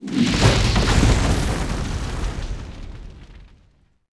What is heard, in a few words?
City Capture; Civ4